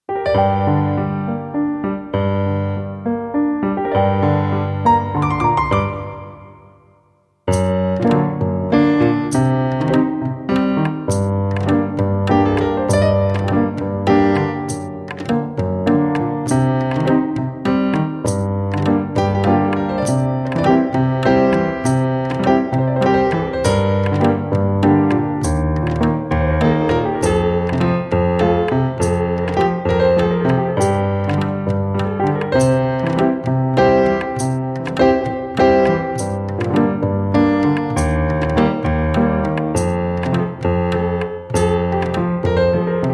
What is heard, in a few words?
Piano Tombak